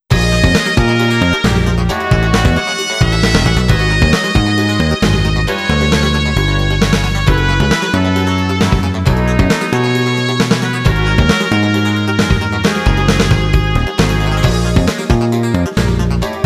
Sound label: BREAK BEAT; Taito Arcade Sound Digital Collection Vol.1